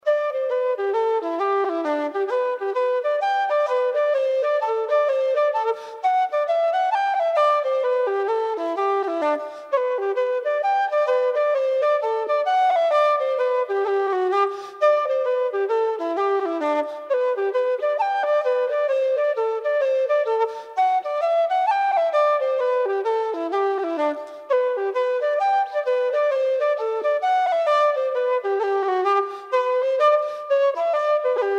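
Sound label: Hornpipe, Traditional Irish Music; Egans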